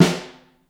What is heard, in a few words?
Overhead, Snare; Ableton Foundation FreePacks; Snare Designer